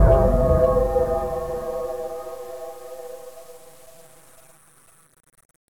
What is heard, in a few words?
lock; X-Files preview